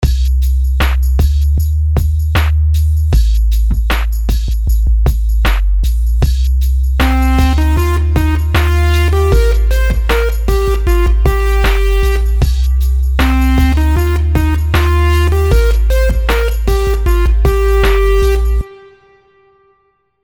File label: melodies simple 16s Cmin; melody